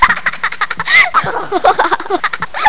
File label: baby